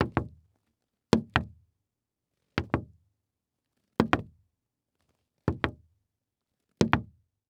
Foley Footstep Hard Sole Dress Shoe Slow Walking On Solid Wood Platform; Foley Footsteps